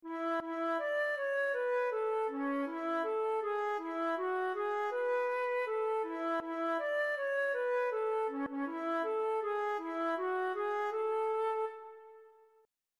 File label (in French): maman les p'tits bateaux, flute traversiere